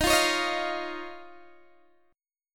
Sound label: Eb 7th Suspended 2nd Eb, Guitar DADGAD; x,18,15,18,18,15; strummed